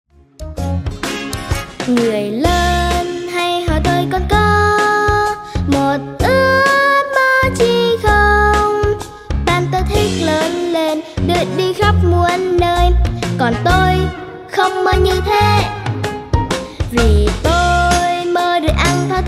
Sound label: beat